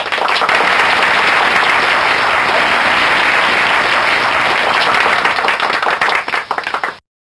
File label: long clapping; kids-tetris; Lab